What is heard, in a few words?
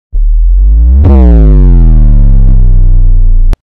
boooooooooom